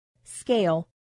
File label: scale